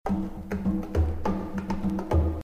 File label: bongos; JavaGameUebungen; slick